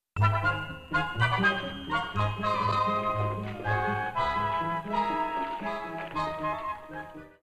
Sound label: Flutes